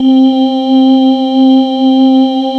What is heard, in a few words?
THE WALK C3, SYNTH; Q Up Arts; Dream Experience